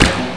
release, weapons; quake2

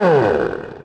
prox open; quake2; weapons

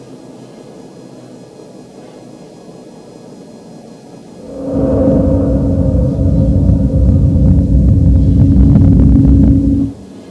drone; quake2

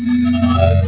telex; quake2

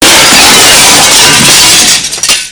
glass; quake2

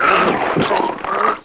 death; quake2

